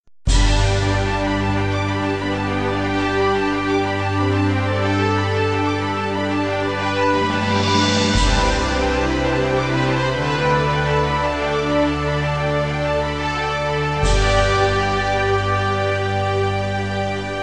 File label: royal tone